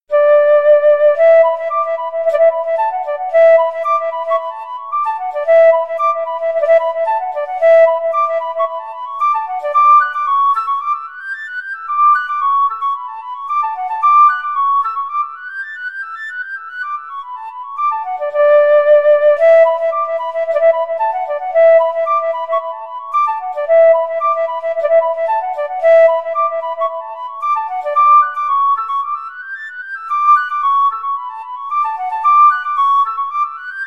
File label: tin whistle